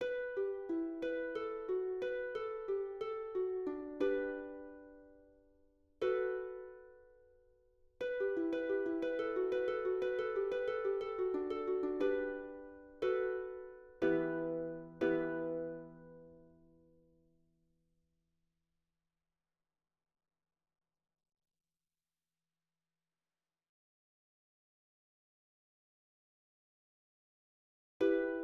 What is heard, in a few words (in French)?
HARPE